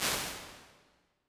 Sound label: Jazz Hall, Lexicon 480L Reverb impulse response; Big Gee's